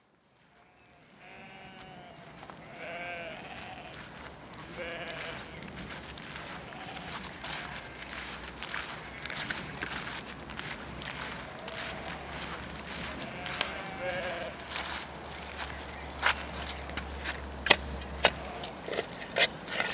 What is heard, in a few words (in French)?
Le paléontologue creuse pour dégager une ammonite